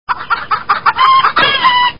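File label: Chickens; Animals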